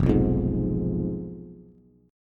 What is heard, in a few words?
F Diminished F down Bass; Fdim strummed; JGuitar